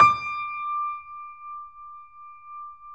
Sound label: D 5, Keyboards Acoustic, GRANDP ST; USB Soundscan vol.17